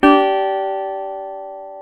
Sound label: GTR, Dobros, National